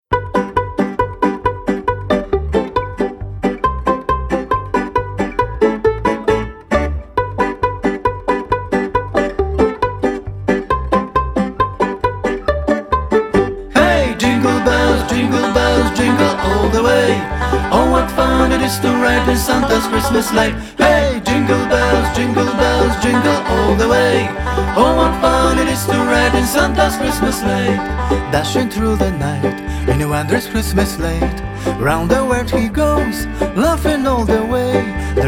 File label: Jingle Bells